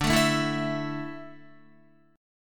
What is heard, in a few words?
D Suspended 2nd D down Guitar; Dsus2; strummed